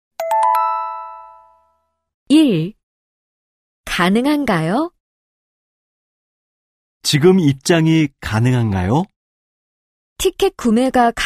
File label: K-POP